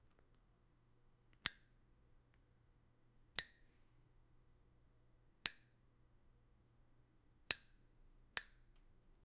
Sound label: light flicker pop